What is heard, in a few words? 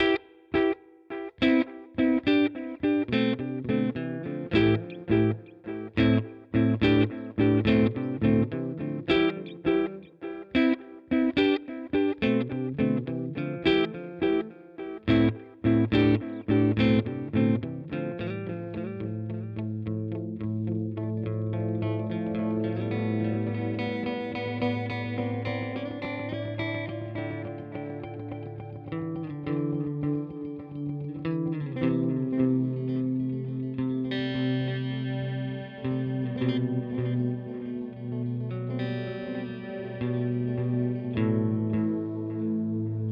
Crazy Tube Circuits Time MK 3